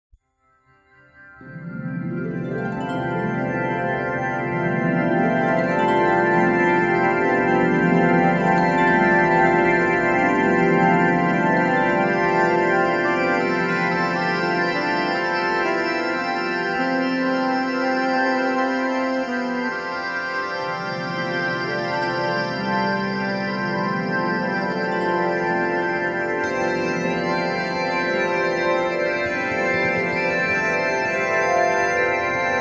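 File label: new age orchestra C maj9 mixolydian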